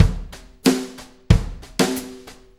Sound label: Brushes Loop, Full Kit Loops; Sonic Foundry (Sony Creative Software); Drum Tools